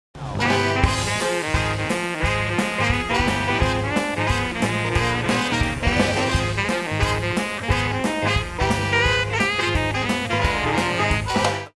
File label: Plays Tenor Sax